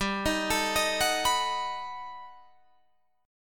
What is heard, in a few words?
G Augmented Major 9th, Guitar, Drop D